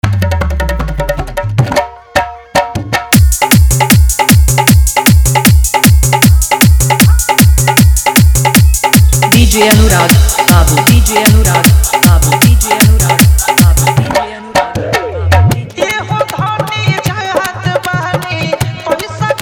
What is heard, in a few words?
Dj Song Hard Dhollki Bass Mix